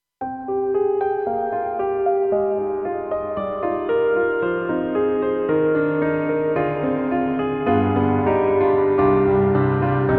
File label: Cinematic Piano; KORG M3 XPanded Program